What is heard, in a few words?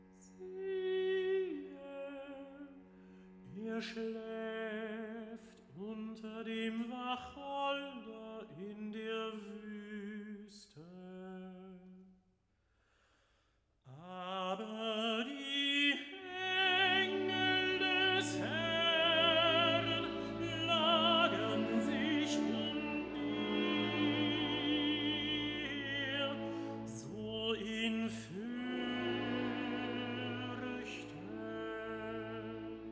recitative tenor